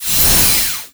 Enemy Woodblock Minion Active Heal; disney star smash; android

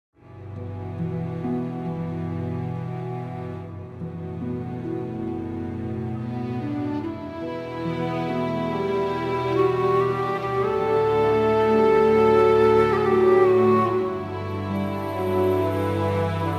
opener village

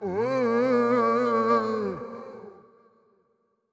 Toxic Mask Knocked Down

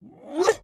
Wind Up; Joblin

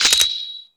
Equip; Puyo Puyo Puzzle Pop; iOS, macOS, Mobile

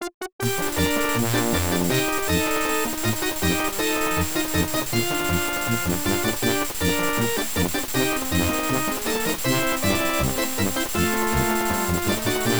Team Jingle; Dick Vitale's Awesome Baby College Hoops; Genesis

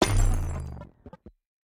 Armor Break

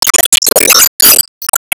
Enemy Stamper Fly

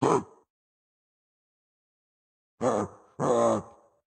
Throw; Caffiend Caelan Cambassador

